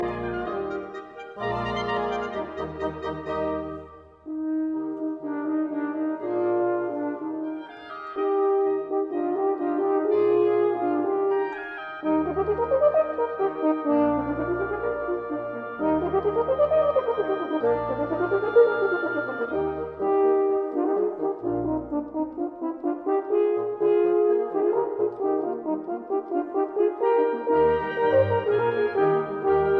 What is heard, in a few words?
Concerto for 2 Horns in E flat major Allegro